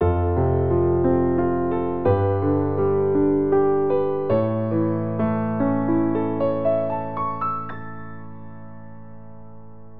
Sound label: Piano; The Legend of Zelda Ocarina of Time